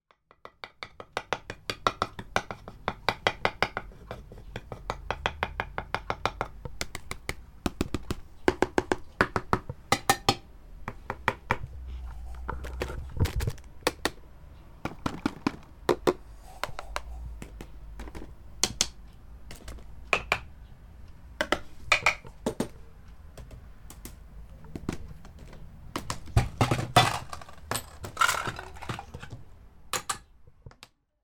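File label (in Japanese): okane de asobu, playing with money; Chino Cultural Complex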